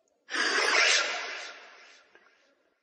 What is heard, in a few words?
Sms Alert